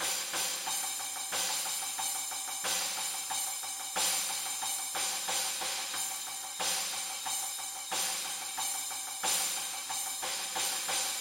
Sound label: Percussion